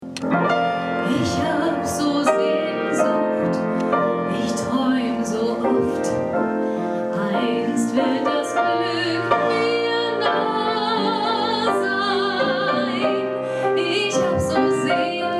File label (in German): gesang